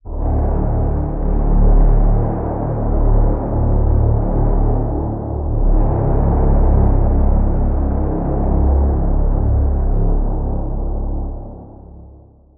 Pad - Obscurity; Routed Sound; Vital Expansion - Quantum Enforcer Vol.1

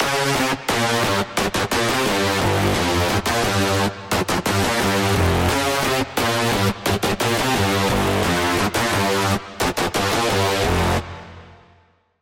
Lead; Routed Sound; Spire Expansion - Chaos Bringer Vol.1

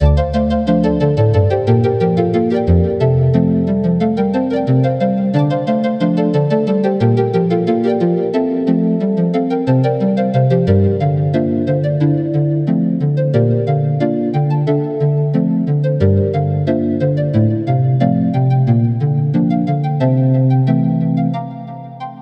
Woodwind; Routed Sound; Spire Expansion - Story Walker Vol.1

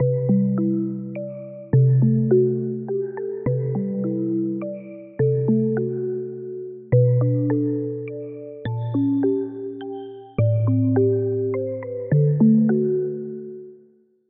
Key, Sylenth1; Routed Sound; Sylenth1 Expansion - Story Walker Vol.1

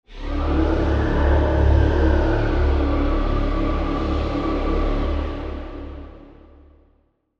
Pad; Monster Field; Routed Sound; Vital Expansion - Chaos Bringer Vol.1

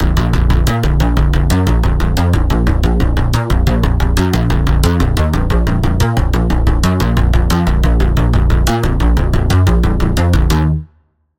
Pulse, Spire; Inverted World; Routed Sound; Spire Expansion; Quantum Enforcer Vol.1

